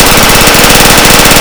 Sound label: Inf Weapons; Red Orchestra 41-45